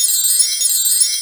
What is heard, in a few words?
WIND BELL C5; Big Fish Audio; Synth City